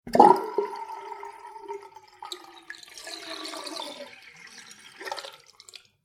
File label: household sink water empty, Plug Pulled On Full Small Sink; Water Drains With Slight Gargle From Plughole; Kitchen; McKinney Sound